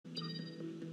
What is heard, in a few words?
hand link ting